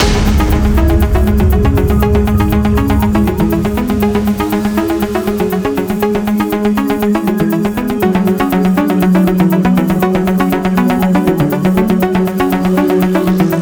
Constant - Loop

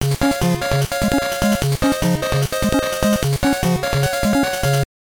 Touchdown; Tecmo Bowl; Gameboy

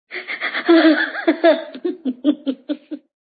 Fem Cry

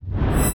Attack Light

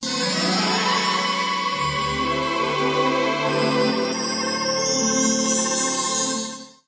Level Victory; Feelers

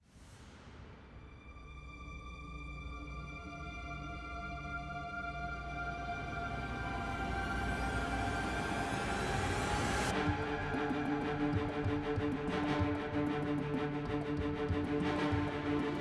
BGM Airship Boss Intro; Fallen Knight

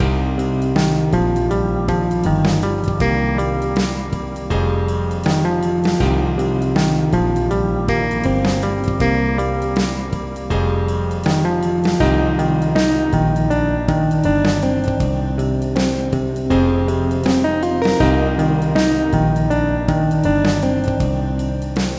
Demon Jazz; Momodora II

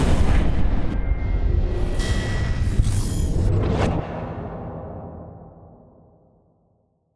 Explo Boss Final

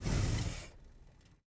vehicle classic turnoff

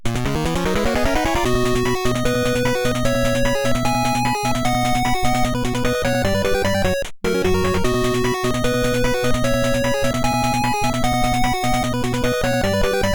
Victory!; Faxanadu; Trunk to Top and Back; NES

